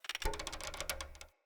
Foley Winch Tension Wobble; Enemy; Nimrod

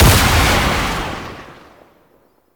Incarnon Wrist Gun Shot, Weapons; Warframe; Tenno

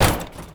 Metal Ladder Hit; Warframe; Ambience; Nineteen Ninety Nine

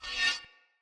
Sphere Polearm Whoosh Accent C, Weapons Player

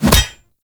Wolf Axe Catch; Grineer Wolf

